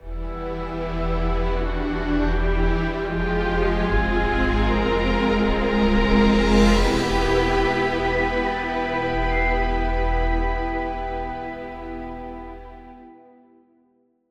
mission fail

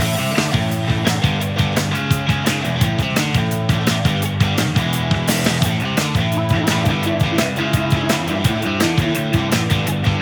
Jam Session; Splattack

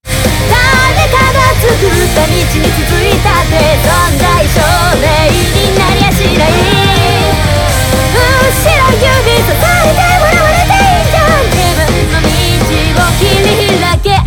BGM xfade; Invaxion

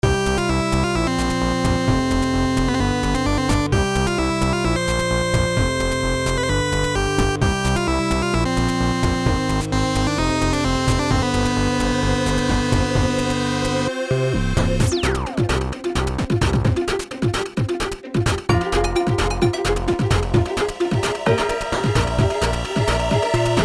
Laser Mountain Theme

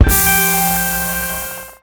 Boss Hit

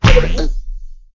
Snowman Tap; Clickergame

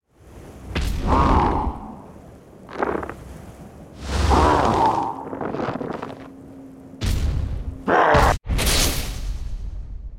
Appear

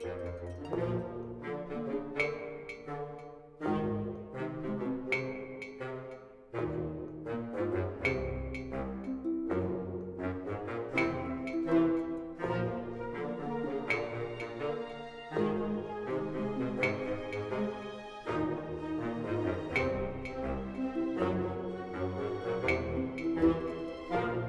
Music Short Punctuations Tiredness 41bpm Loop; asterix obelix slap them all 2

